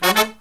Killer Brass Riffs; USB Soundscan vol.29 - Killer Brass Riffs; AKAI

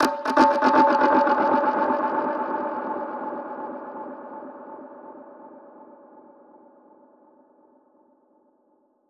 Perc Hit, dub percussion; musicradar

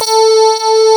SYNTHESIZER DIGI DECAY

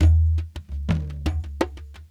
brekete, large darbuka

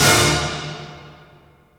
ORCH; Crypton - DrumBase5000

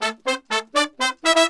120 AM R, BRASS SALSA; Best Service ProSamples vol.14; AKAI

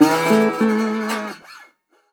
Blues Guitar Samples; ILIO - Fretworks

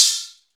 PRC X SPRIN, Misc Metals; Club-50 - Foundations Roland; CowBel+Metl

